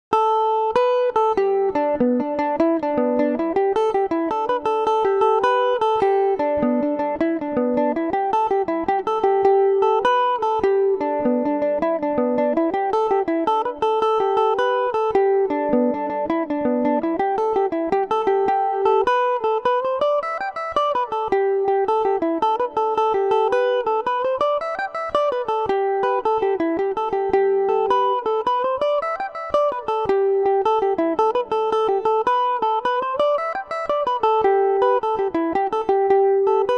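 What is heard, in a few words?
G major mandobird, Irish mandolin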